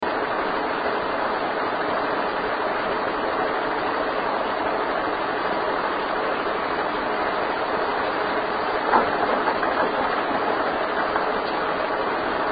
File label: furniture room running sound; elsings 2nd hand shop; furniture room approx 6:30 am